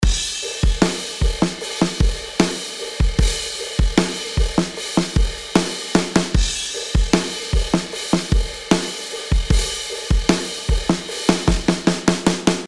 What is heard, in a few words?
drums even